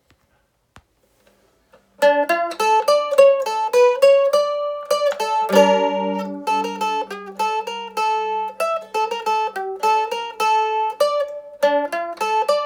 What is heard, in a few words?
Bulgarian folk, mandolin